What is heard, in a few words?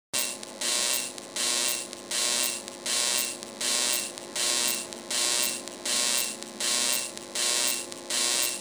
vga test